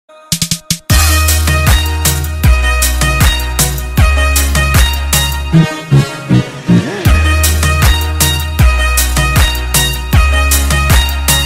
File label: Instrumental Ringtone